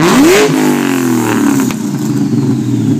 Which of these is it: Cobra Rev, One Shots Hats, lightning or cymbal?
Cobra Rev